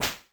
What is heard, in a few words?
hit; Incantation